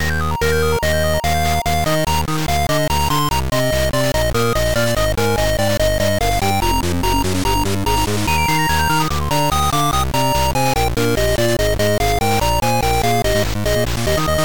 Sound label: 8bit Adventure; Rei Yumesaki